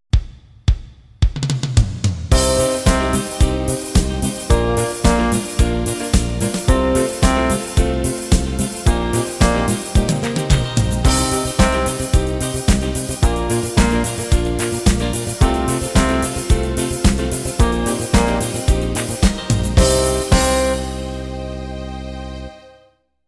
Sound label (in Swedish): Flugan flyger, trombon trumpet